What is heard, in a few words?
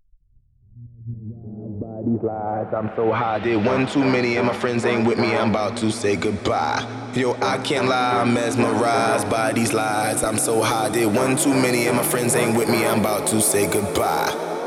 I'm So Hot Tech House 128 Bpm